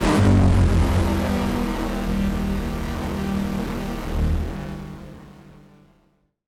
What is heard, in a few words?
Malfunction Synth, malfunctioning synth samples